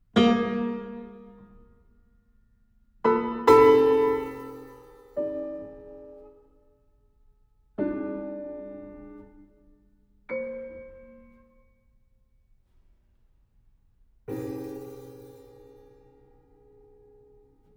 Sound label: For Prepared Piano